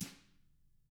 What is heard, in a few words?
TAMA drums; ILIO; Double Platinum Drums 1